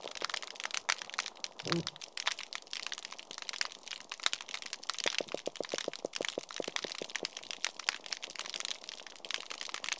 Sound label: Reef Sample